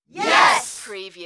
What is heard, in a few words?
Crowd Yes